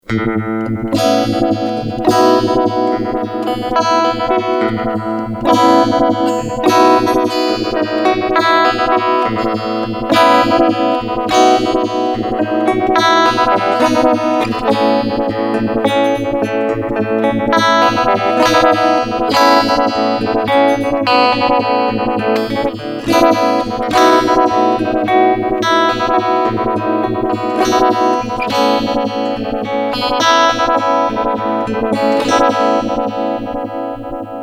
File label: Chorus